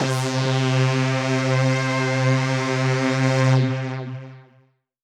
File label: Synth C, Synth Prophet One Shots; Electronisounds